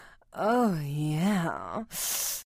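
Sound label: ston jen, women moan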